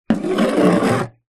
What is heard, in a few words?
metal object scratch rusty metal surface